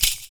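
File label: PERC, percussions; Univers Sons - Raricussions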